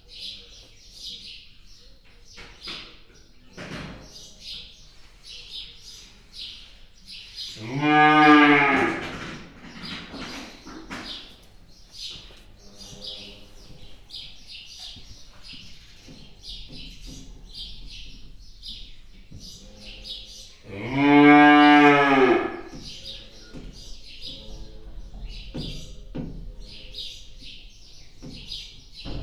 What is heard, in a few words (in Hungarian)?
5 hónapos bika, őstulok; Hortobágyi Nemzeti Park